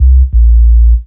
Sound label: Worries Sub - 5A; Jungle; Bass; Blu Mar Ten; Jungle - 1989 to 1999